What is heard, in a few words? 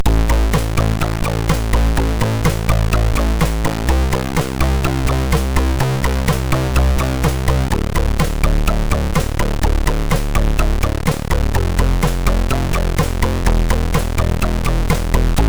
Oehh, MOS6581R4